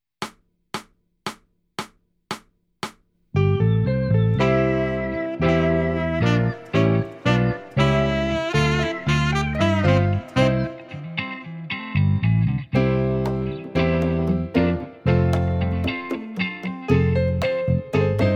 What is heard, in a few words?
Film Noir Backing